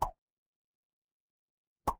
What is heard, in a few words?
cartoon pop